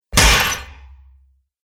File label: Fantasy Enchanted Blade Hit, sword; Sword Sound Effects